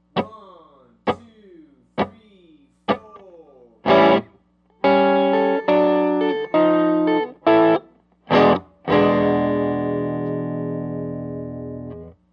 Blues Turnaround in A

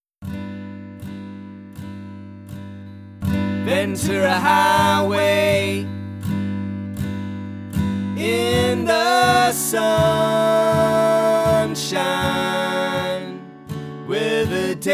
80bpm

